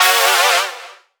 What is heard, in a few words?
Synth Shot; Vengeance Samples